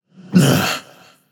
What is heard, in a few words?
grunt hit, voicepack; smite; vamana